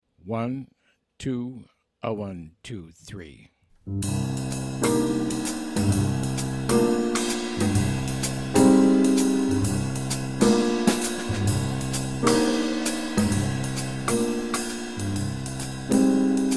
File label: minus bass